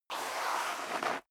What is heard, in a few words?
step high heels gravel light slide long; schritte; Footsteps; menschen